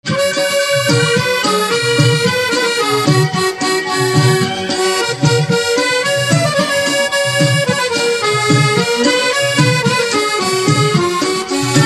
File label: Accordion Instrumental Ringtone